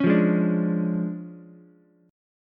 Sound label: D Minor Major 7th Flat 5th Ukulele, chord; DmM7b5; strummed; JGuitar